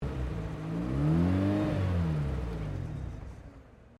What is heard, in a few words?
CAR MAZDA RX7 SERIES3 EXTERIOR FRONT IDLE THROTTLE STALL UP; Mazda Rx7 1983; Cars (Sound Effects:Vehicles)